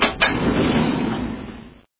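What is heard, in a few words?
opened desk drawer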